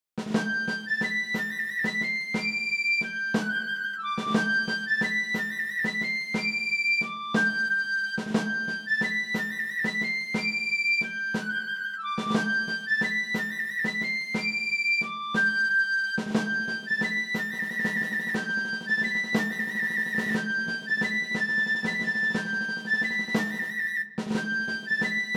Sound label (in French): breloque